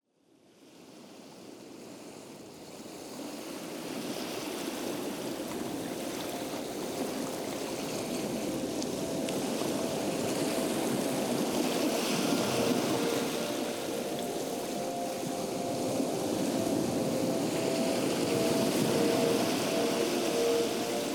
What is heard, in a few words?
Music with Ocean Waves